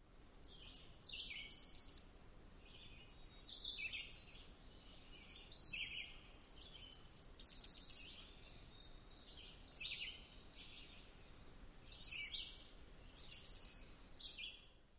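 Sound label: songs, Blackburnian Warbler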